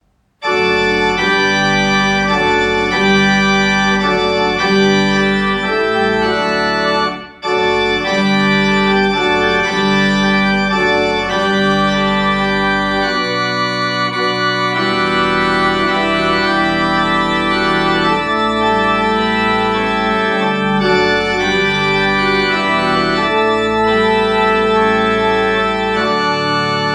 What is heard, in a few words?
Klais, pipe organ